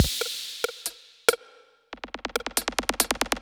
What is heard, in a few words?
electro beats 140